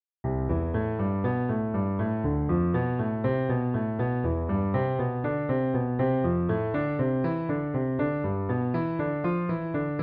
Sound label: hanon exercise, piano; Charles-Louis Hanon (1819–1900)